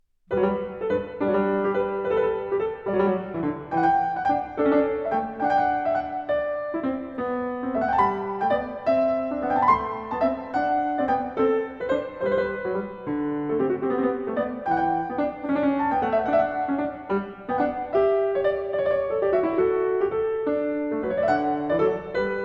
Goldberg Variations, BWV 988 - Var. 7 a 1 ovvero 2 Clav. Al tempo di Giga